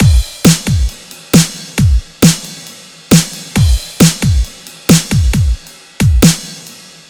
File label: Massive Kit; Dubstep